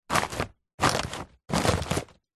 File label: household large green waste garden bag full plants foliage impacts light x3